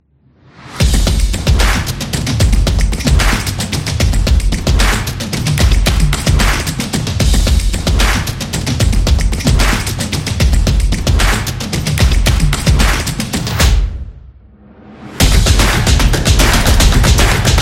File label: Drum